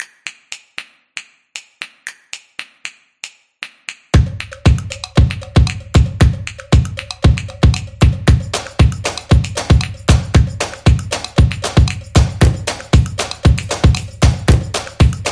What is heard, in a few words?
Click, percussive